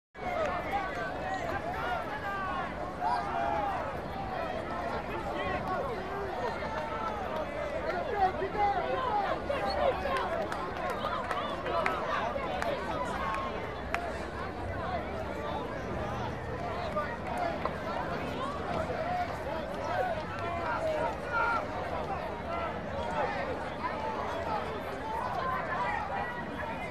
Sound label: Football Crowd Ambi